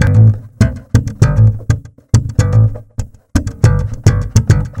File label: Bass Slapping Am